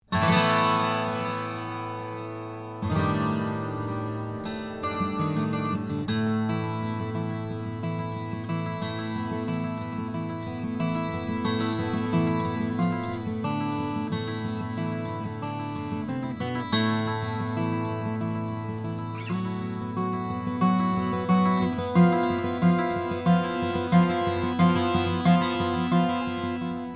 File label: Guitar; Diablo Anniversary